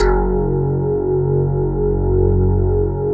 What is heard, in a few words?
basses; Wizoo - Powered Wave